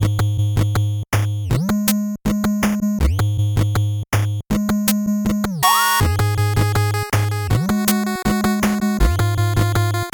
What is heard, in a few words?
8bit bgm slow